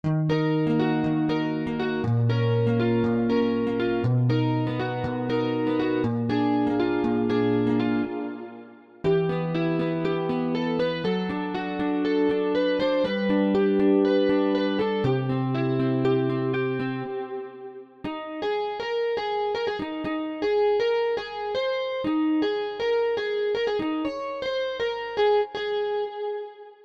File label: Folk Indie